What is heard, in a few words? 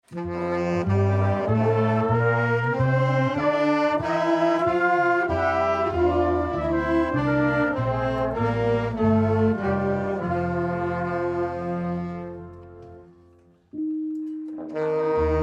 Music Class Band Scale Exercise, Orchestral; Musical; Big Room Sound